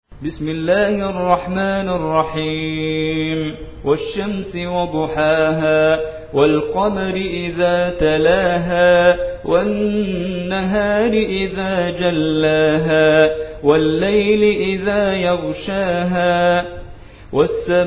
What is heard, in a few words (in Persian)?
سوره شمس